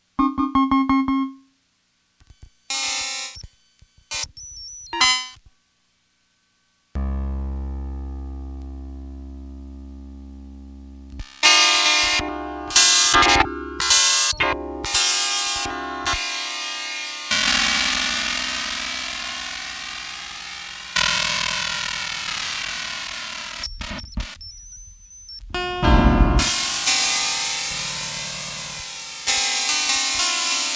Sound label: Piano; Princess; puppy